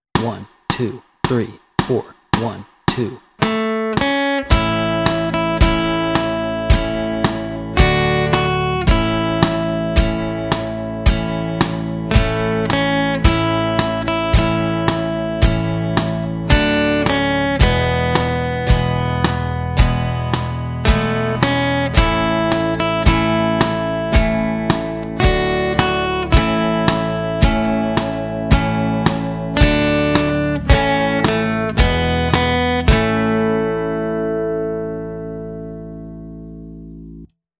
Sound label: Kum Ba Yah Melody and Chords Track, guitar